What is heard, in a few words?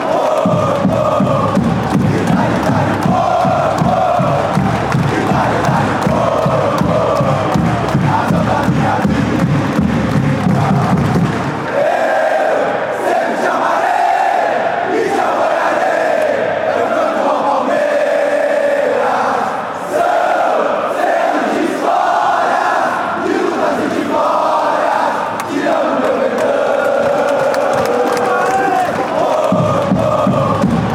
ohohooh, chants